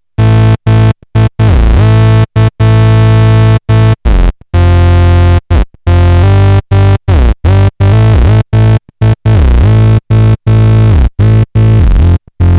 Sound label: glissando party, Novation Bass Station II; analog monophonic machine with subtractive synthesis